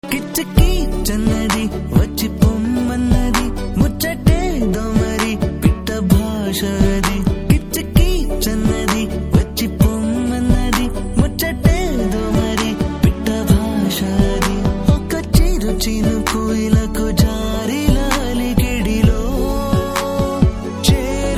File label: BG Telugu Ringtone